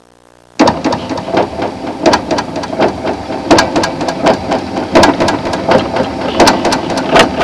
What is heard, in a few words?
WIPERS; Transportation